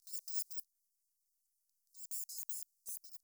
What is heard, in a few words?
Rhinolophus blasii